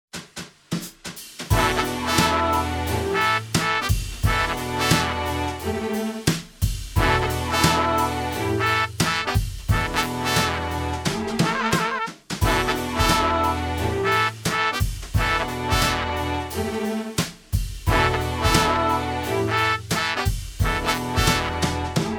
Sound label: drummer